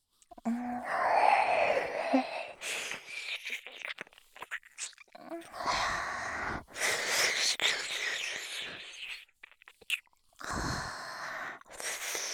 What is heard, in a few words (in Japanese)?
耳舐め 手コキ, ASMR; アドリブ集